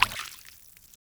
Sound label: Taunt demo stagger slosh